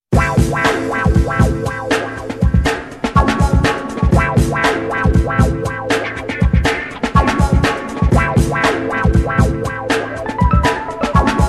jazz

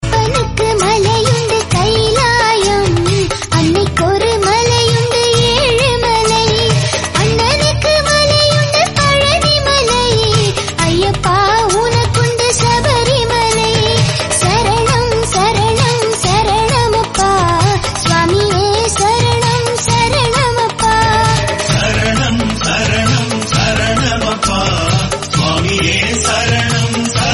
Tamil devotional; Ayyappan; Religious

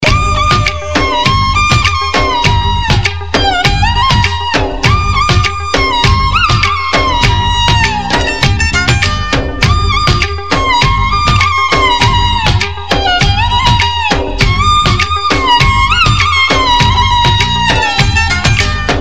flute; Aarya; Indian

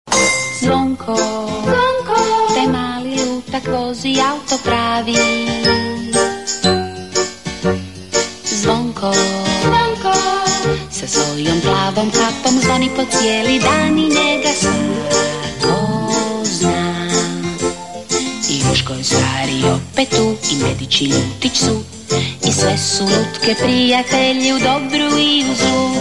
cartoon; Zvonko

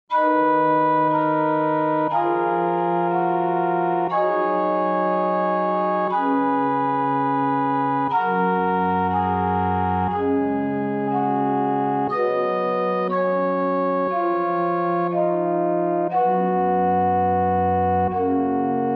maltese church pipe organ ringtone; Balzan Maltese Church Pipe Organ Ringtone; Religious

